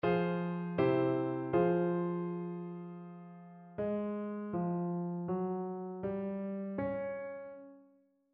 melodic fragments; eartraining

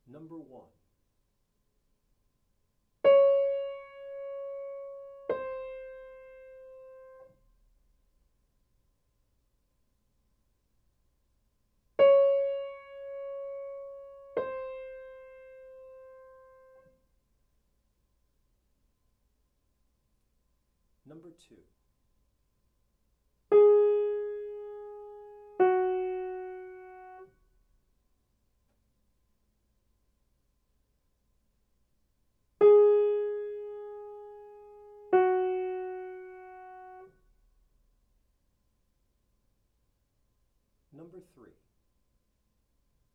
intervals; eartraining

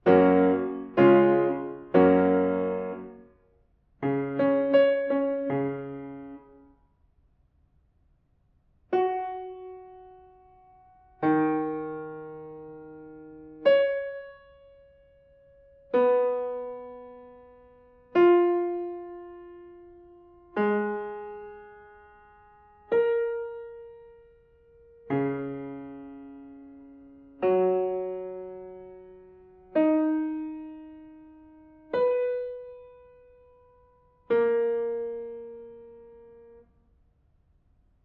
diatonic collections

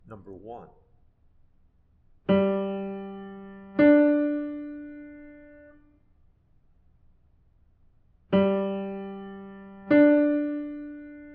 intervals

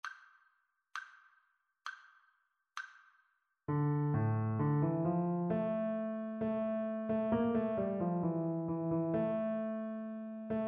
rhythmic dictation

